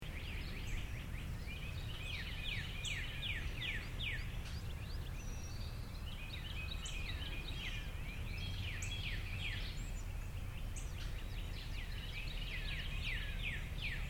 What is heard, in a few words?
Nashville Morning Ambience, Birds singing cheerfully; pastoral and peaceful, beautiful morning birdsong; Environmental Sound Effects